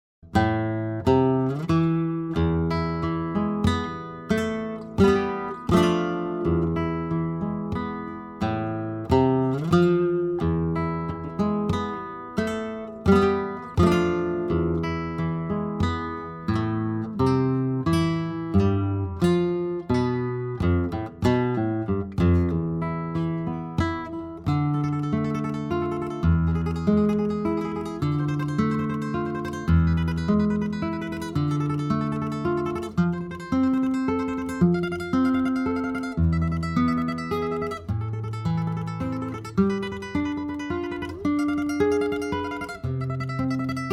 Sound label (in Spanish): soledad guit